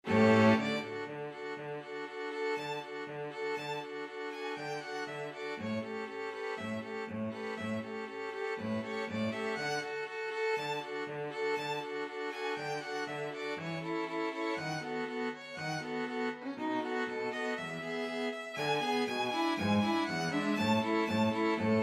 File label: string quartet